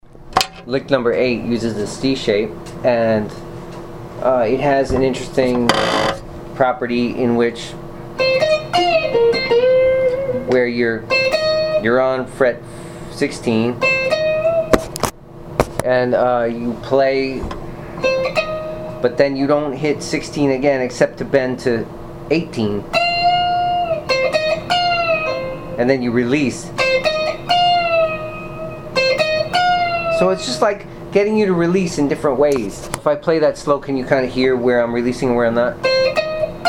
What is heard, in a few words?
string bend lick